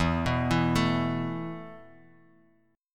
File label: E Suspended 4th, Esus4 chord; Esus4 arpeggiated